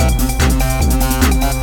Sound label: drumloop; awe32